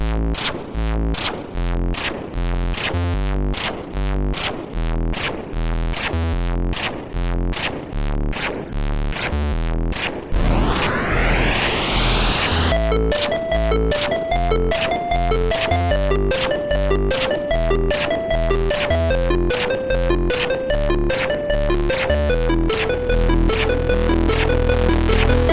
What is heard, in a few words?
Freak One, MOS6581R3